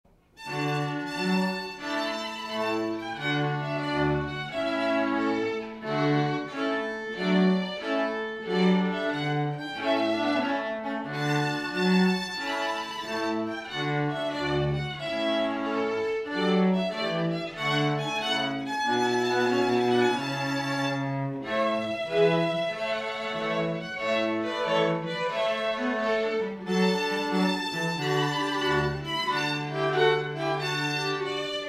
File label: Trumpet Tune; wedding music; string quartet; Preludes before the wedding